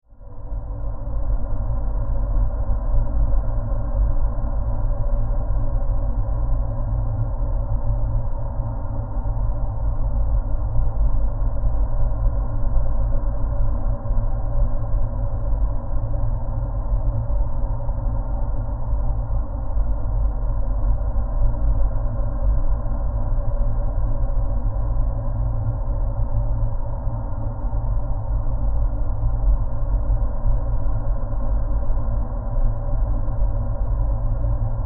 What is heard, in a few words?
Horror Drone Airy Wind Deep; Evil Sound Effects; Evil (Sound Effects:Horror); SFX Bible 6